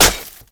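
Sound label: movement pick ice snow; footsteps; Call of Duty Modern Warfare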